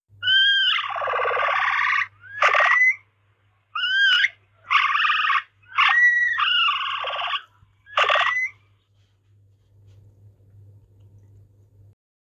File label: Nutria; نوتریا